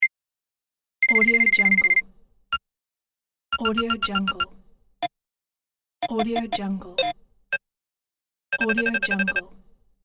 counter beep bundle

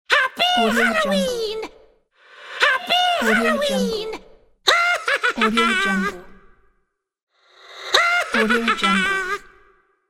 happy halloween and laugh

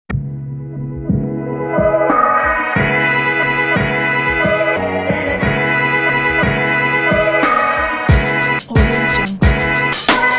hip hop vlog; marblespace

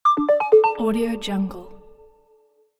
message

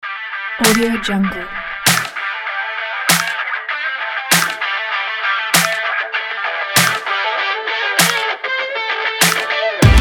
extreme pack

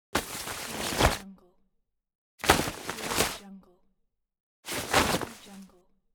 raincoat

